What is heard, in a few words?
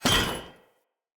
Two Handed Mace Drop C; Weapons; Enemy; Blunt; Sinecure Two Handed Mace